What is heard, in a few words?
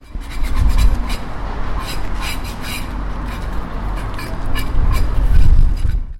scraping